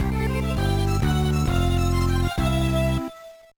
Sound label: Jingle; konjiki no gashbell yuujou no zakeru dream tag tournament 2005 gba